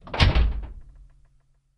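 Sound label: Cutlass Interior Door Close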